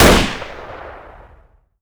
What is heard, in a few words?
Bullpup Fire